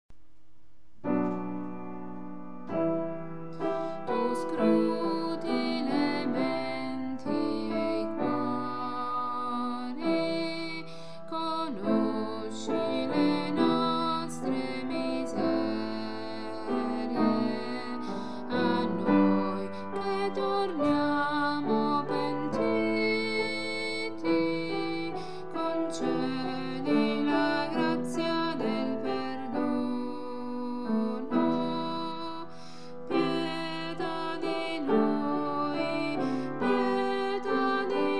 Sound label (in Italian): bassi; asolta creatore pietoso